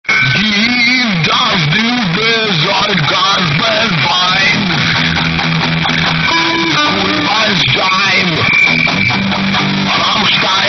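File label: ringtone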